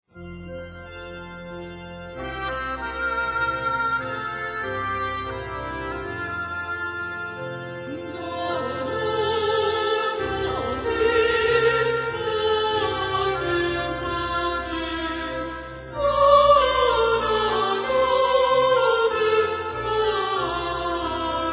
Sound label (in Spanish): sexteto; coros solistas; dona nobis